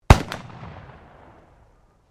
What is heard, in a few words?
Musket flintlock firing, Black Powder firearms at a shooting range; Gun Shot